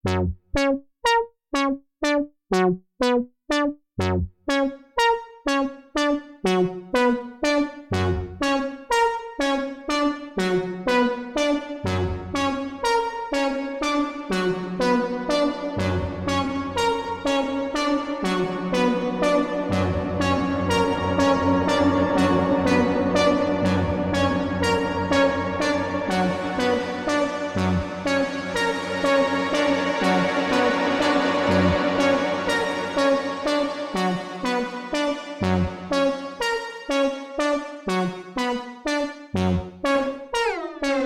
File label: Room